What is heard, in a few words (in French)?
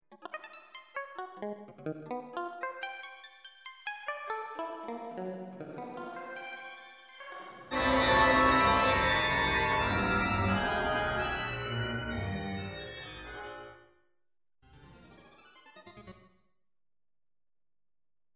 cordes pincees